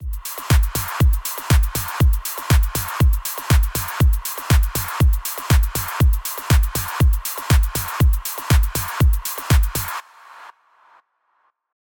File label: Rapid House Constructed; Parawave